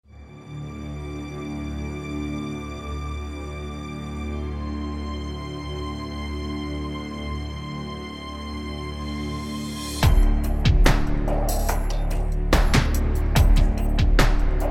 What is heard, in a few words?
Action Drama